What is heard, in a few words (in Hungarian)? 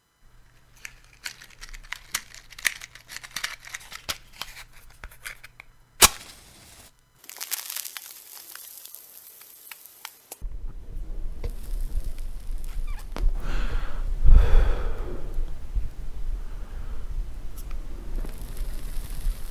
pipa